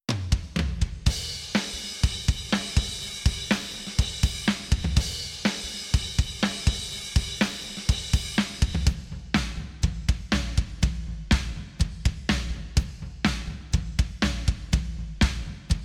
drums reverb; reason essentials

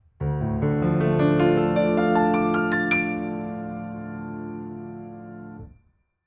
Nord Piano 4 test royal grand 3D reso on

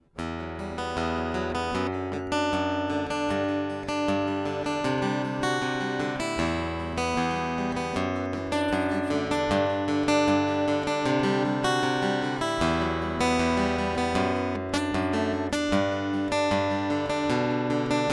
Baton Rouge piezo picked; X11S/OME; Baton Rouge X11S/OME Test

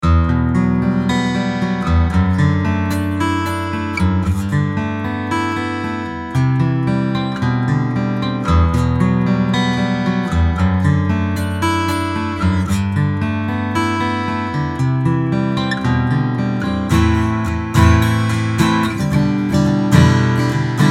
ibanez jgm10

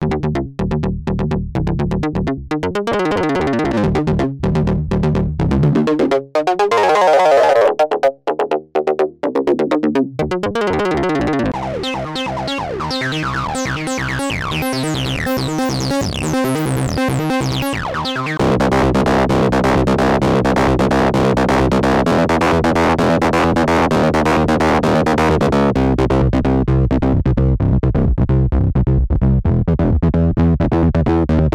arpeggiator; pioneer toraiz as-1

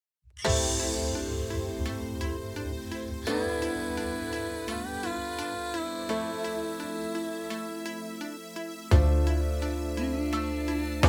full mix brit rock plus 6 dB input

